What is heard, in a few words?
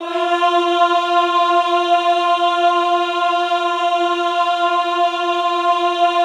EHH F3, Classical Choir; Best Service; Extended Classical Choir; EHH MIX